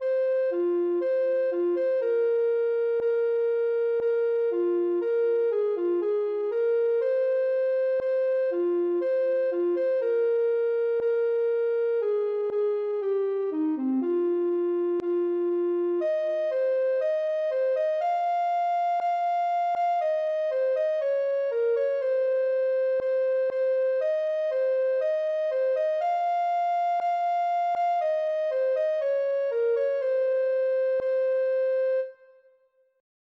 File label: ocarina